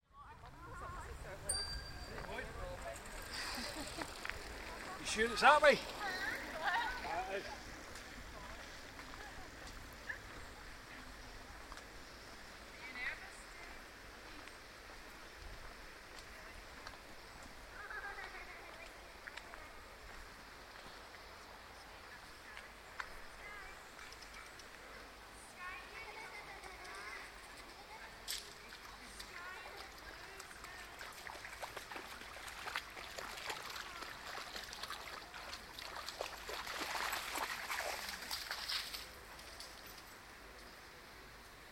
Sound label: kelvin walkway passing cyclists and a dog